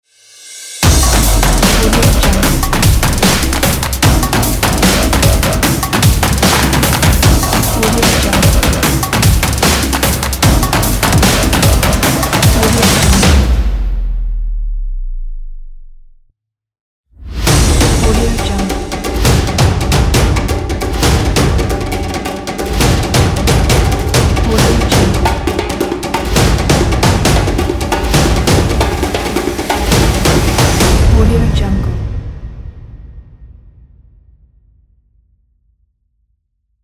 epic drums logo

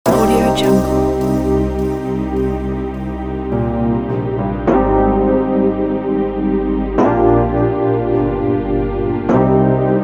urban vibe

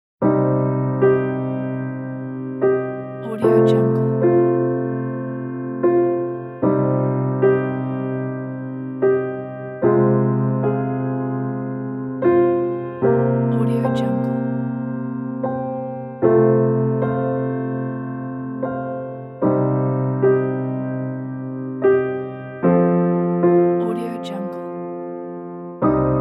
storytelling sad piano; melodrama

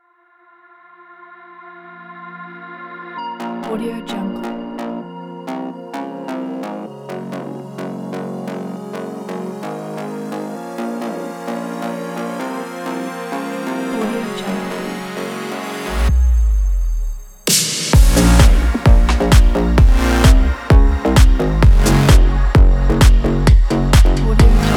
workout fitness sport electro

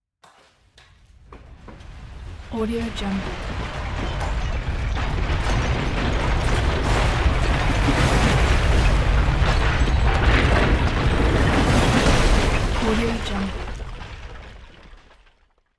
building collapsing; moonduststudio

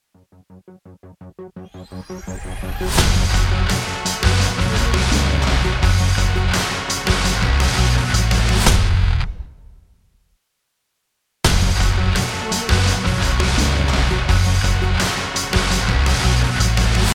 industrial logo

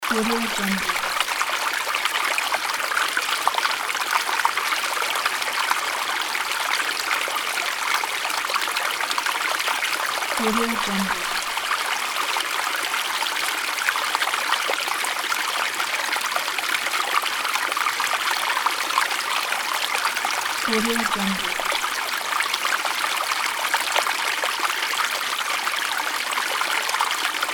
flowing river loop

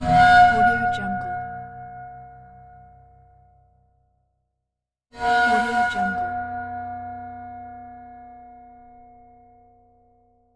metal scrape squeaks low

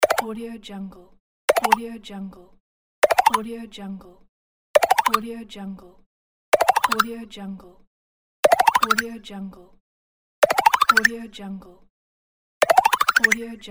increase power up; gamechestaudio

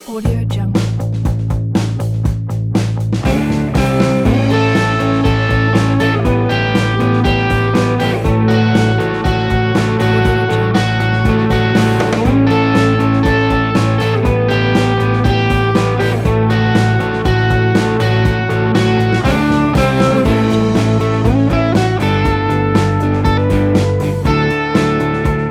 sixties revival

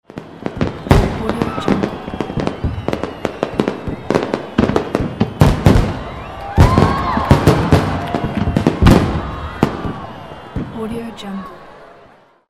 fireworks

